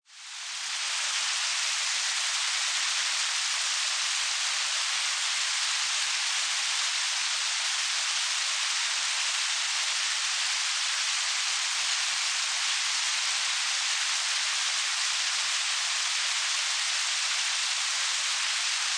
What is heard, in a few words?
Nature Weather Rain, Steady rainfall, general rain ambience. Medium to heavy rain falls on lak; Steady rainfall, general rain ambience. Medium to heavy rain falls on lake or wet surface.